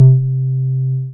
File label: BAS MINI, Mini-Moog